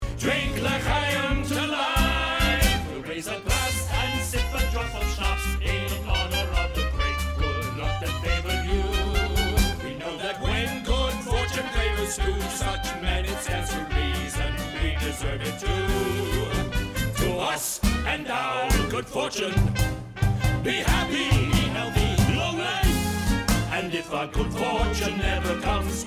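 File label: tenor baritone track guide vocals; Fiddler Auditions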